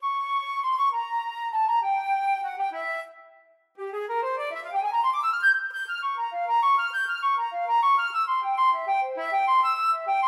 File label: sorcerers apprentice, flute